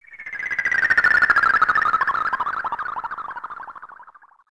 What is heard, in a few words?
INSECT, Special FX Twisted; USB Soundscan; AKAI